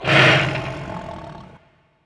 rasp; trex

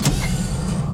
power; wob2 demo

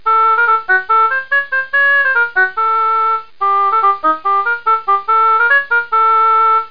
Snake Charmer

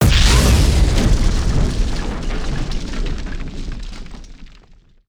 Hit Effect-Building; 1944 Battle Of The Bulge

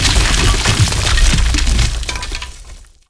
crushing tower; 1944 Battle Of The Bulge

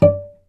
pizz cello d5; DirectX SDK; DMusProd

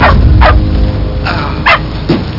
moo; Amiga 8-bit Sampled Voice; worms dc; arnie

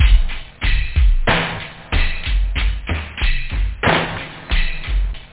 hip hop; OctaMED SoundStudio V1; house

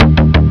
Drums; Bedlam

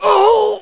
moan; Creative Voice

